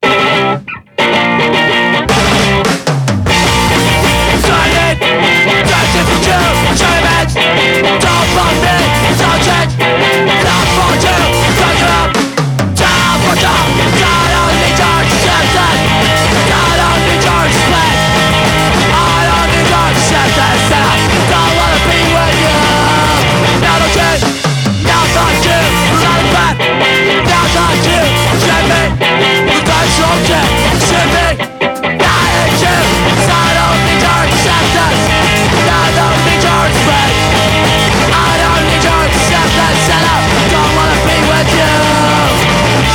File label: Electric Guitar